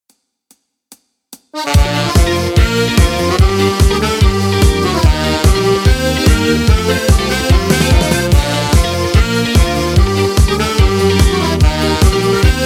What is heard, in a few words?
acoustic ver.